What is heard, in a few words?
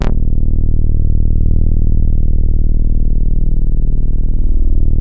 BOUNCE, BASSES